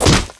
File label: hit punch; umbrella